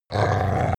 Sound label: border collie snarl; natur-tiere; hunde